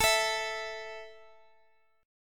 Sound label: A 5th A down Guitar Standard, chordshape; strummed; JGuitar